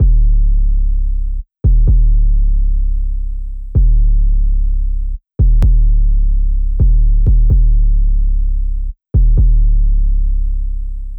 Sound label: Drum Loop 128BPM BD; Drumloops; Multi Loops; VEC5